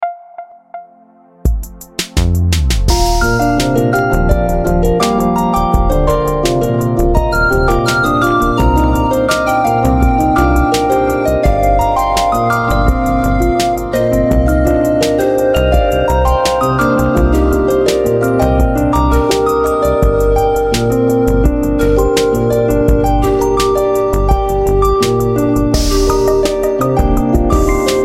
fantasy forest remix

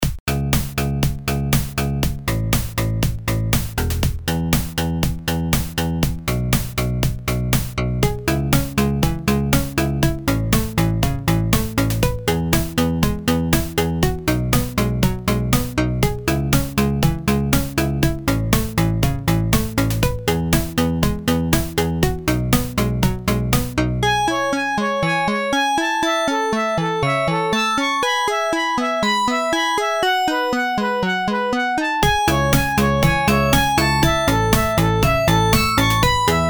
chord and FM test; Bandit; bat

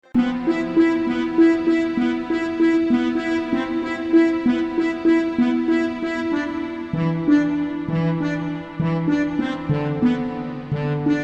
harp

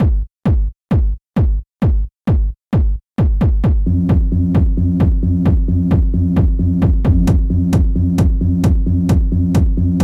beat the drum

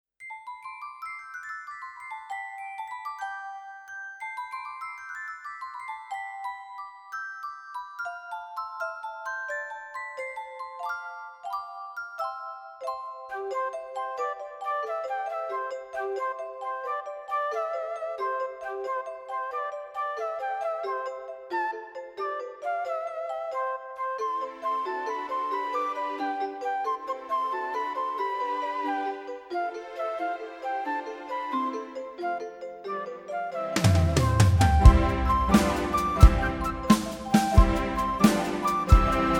music box

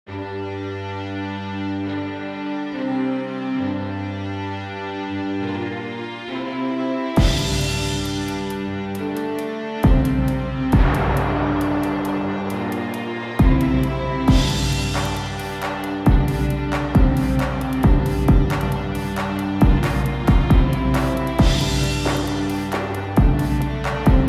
dubstep thing

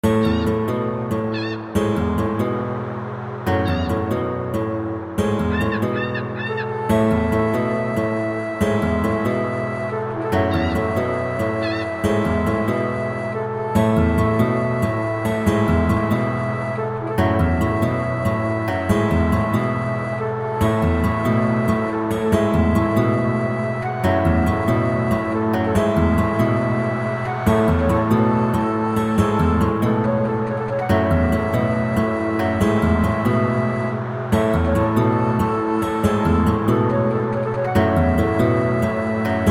acoustic remix

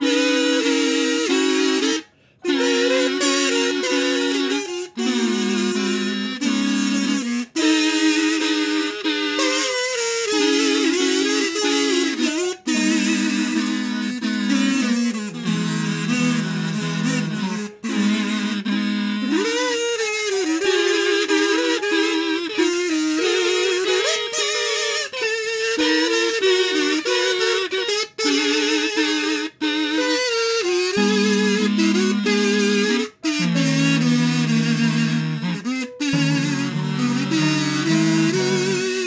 kazoo

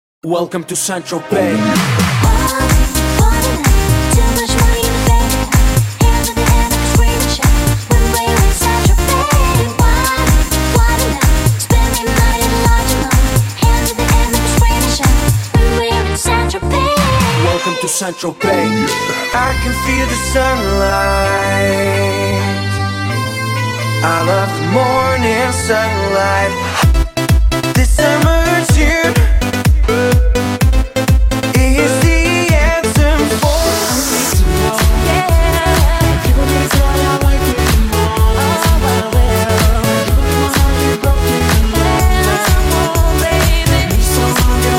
intro 128 bpm

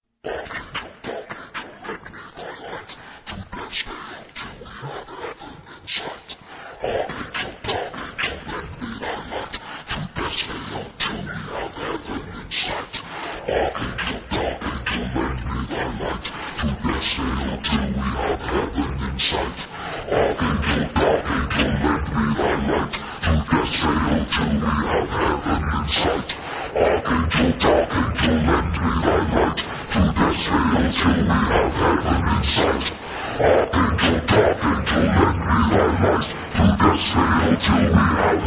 recorder

